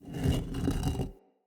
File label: Shrine Puzzle Stag Turn